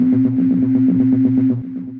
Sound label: Synth Keys; Buzz Walk